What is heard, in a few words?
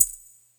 shaker one shot b key; percussion